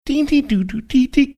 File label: singing first few notes; doogie howser md